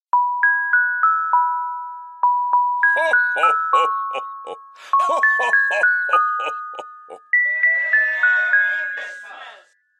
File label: santas laugh xmas